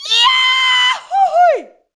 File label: Yodel F Vox; Roland BK-5